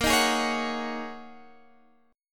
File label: Ab 7th Suspended 2nd Suspended 4th, Bb